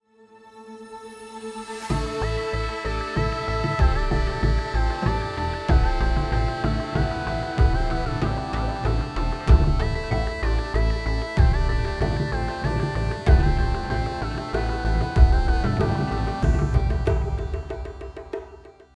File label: Music Tutorial